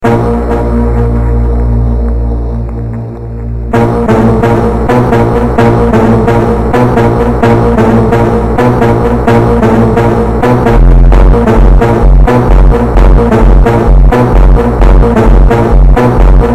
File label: Phonk MONTAGEM